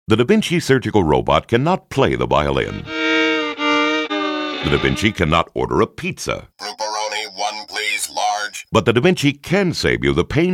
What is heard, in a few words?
violin; Sacred Heart Hospital “daVinci Can … and Can’t” Radio; radio campaign